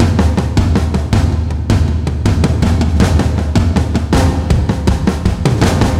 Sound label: Drums; музыка для фильмов